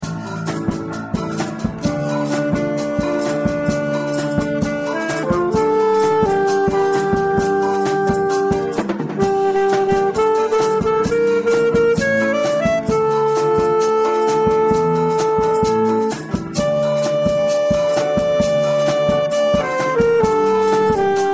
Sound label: solo saxo